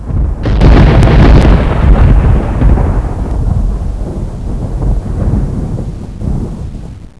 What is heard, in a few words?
thunder